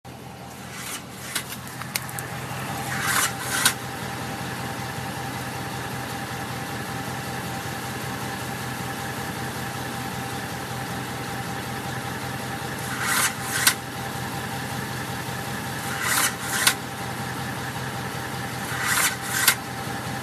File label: truck 4wd noise; 3rd gen ram; 4wd light